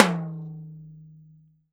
TAMBORIM, Brazil Drum Perc; E-MU Producer Series Vol. 7 – Old World Instruments

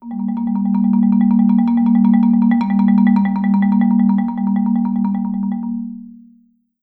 A#2, Marimba roll; Sampleheads - Dave Samuels Marimba & Vibes

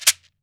MARACA; AKAI S6000 CD-ROM - Volume 5; GM PERCUSSION KIT 2

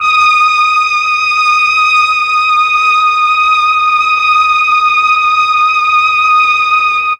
MEL STR, Legendary M400; ILIO; Propeller Island

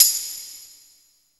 TAMB; East West - Phat & Phunky CD2; 90's Sample CDs

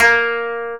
KOTO A#2 -L; USB Soundscan vol.60 - Asian Traditions; AKAI

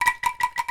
B4 ANKLUNG, JAVA ANKLUNG; AKAI S6000 CD-ROM; WorldSounds

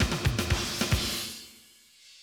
110, Classic Drum Loops

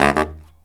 Killer Brass Riffs

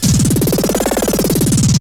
WHOOSHES; Megabass Remix! 2

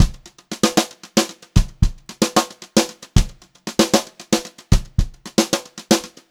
brushed drum loops; USB Soundscan vol.39; AKAI; 76 HOTROD

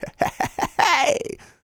LAUGH; Spectrasonics Vocal Planet; Jazz FX

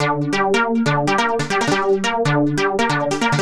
Arp 140bpm G, Trance

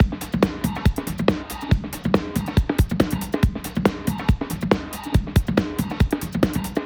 Break Beats, 140 BPM

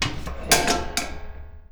ROLL, household; AKAI Anime Sound FX

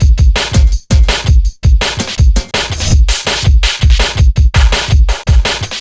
165, Drum Loops, Drum & Bass; Total Drum&Bass

